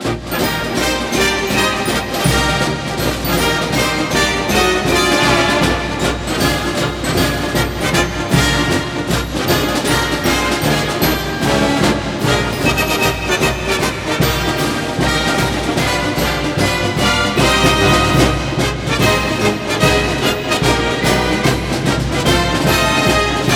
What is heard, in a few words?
Fight Song; NCAA Basketball 09; PS2, PS3, Xbox 360